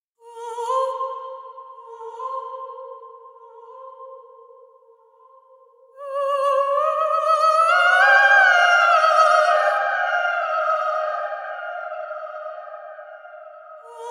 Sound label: VOICE OF WIND; Soundiron